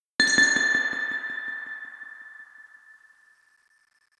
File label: Reaktor Drop; Inquisitor 3